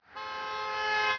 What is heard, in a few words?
car honk; ambient; Counter Strike Model Pack; CS:GO